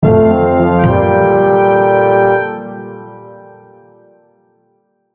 logo orch

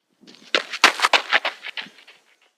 run through

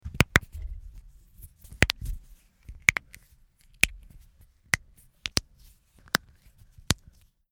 crack fingers

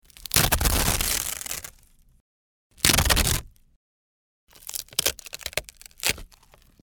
nori yaburu

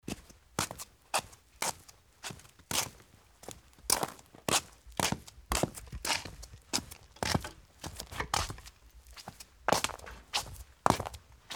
footstep slippers

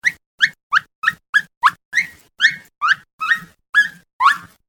toy trumpet

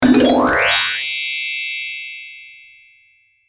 sfx up

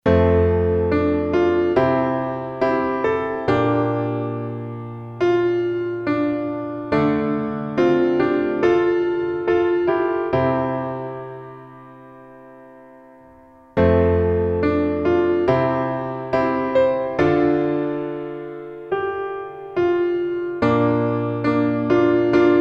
piano; takedano komoriuta

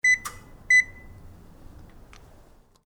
security card

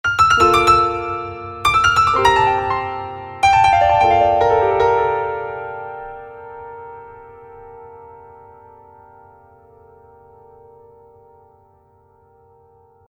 jingle piano

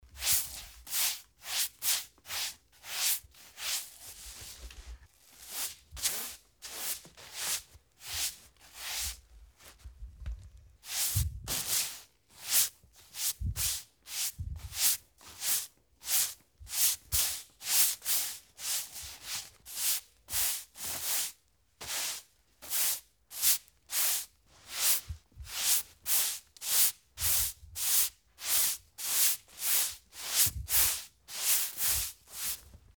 broom

